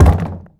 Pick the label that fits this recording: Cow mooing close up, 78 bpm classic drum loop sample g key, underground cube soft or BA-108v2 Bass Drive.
underground cube soft